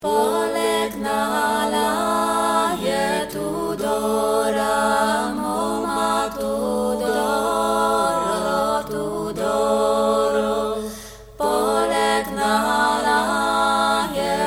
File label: Polegnala Tutti, chant; POLEGNALA E TOUDORA; Orfées Productions